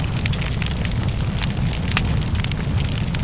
fire, anomaly; Soundscape Overhaul

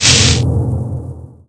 net shield, anomalies; Dynamic Anomalies Overhaul; eugenium anomaly

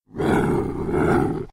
threat, monsters, cat; Soundscape Overhaul

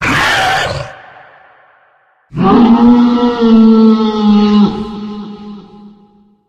hit, monsters lurker; Soundscape Overhaul

